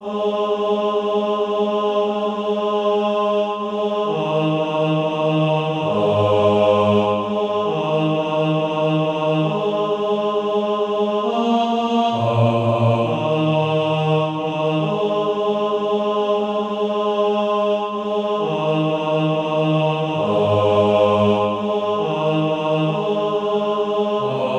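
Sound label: bass, SATB Practise Music; Christ Jesus Hath the Power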